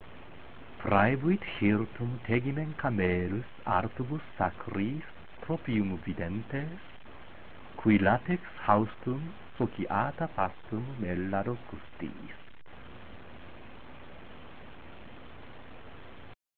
Utqueant, latin

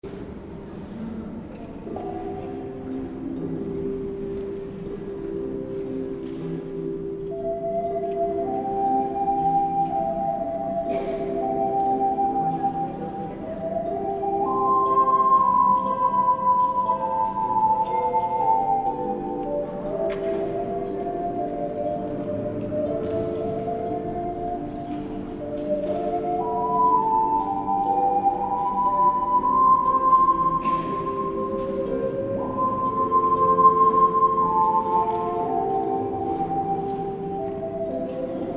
Lullaby; ソロ; オカリーナ; ブラームスの子守唄; （栃木県宇都宮市）

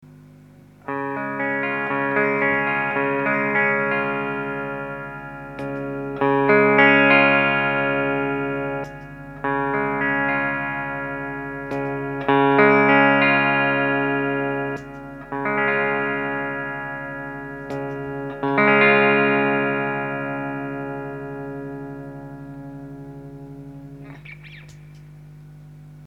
Keeley Compressor, CLEAN COMPRESSION; SUSTAIN6・LEVEL5

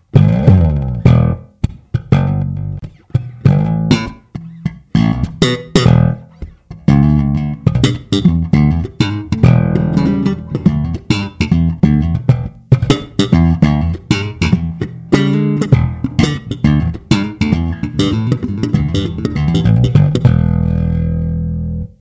slap, bass

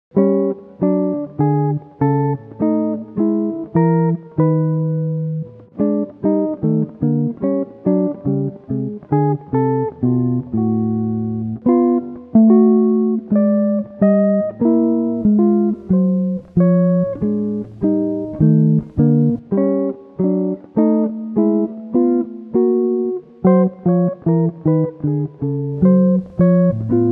S Guitar

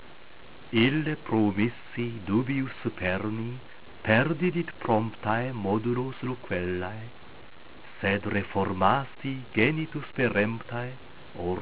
Utqueant, latin

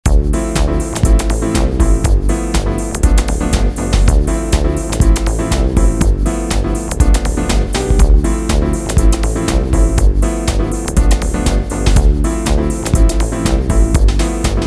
loop

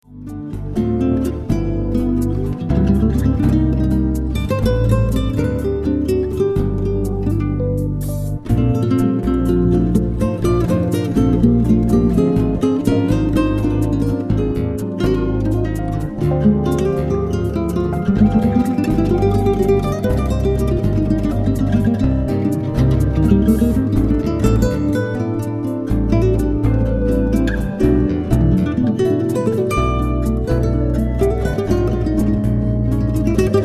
Orca Inst

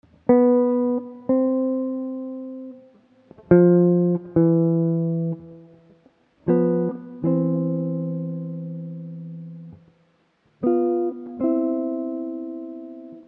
S Guitar

